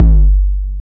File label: HD BD, Hard & Loud Techno